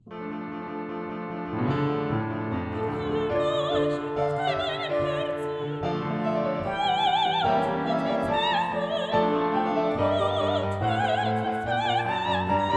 Sync Wavetable Soni

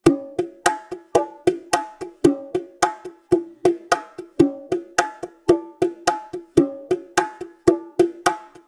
Bongo; TSMtoolbox

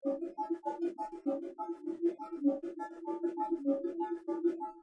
Bongo

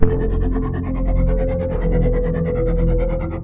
Synth Poly; TSMtoolbox

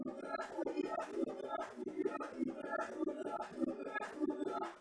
Bongo

